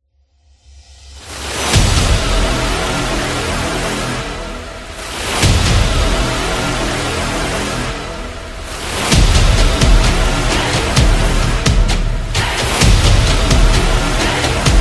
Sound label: Trailer Build Up